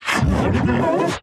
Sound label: pain